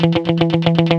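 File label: GUI 120, guitar; Best Service Gigapack 2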